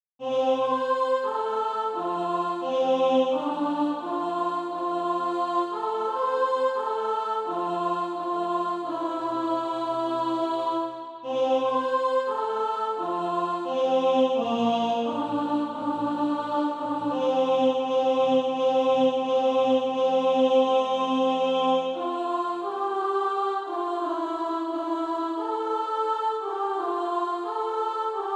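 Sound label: alto, SATB Practise Music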